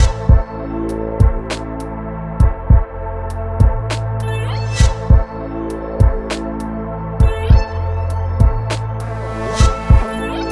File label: Trippy Hip Hop Rap Loop